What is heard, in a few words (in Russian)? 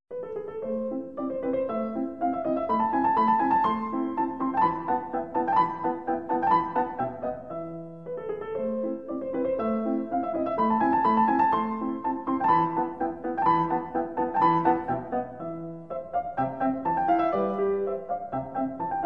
соната ля мажор 3 часть 1 рефрен; моцарт